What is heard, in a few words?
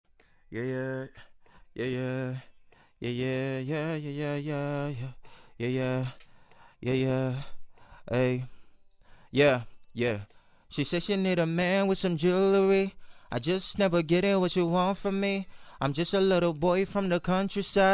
pop, G, acapellas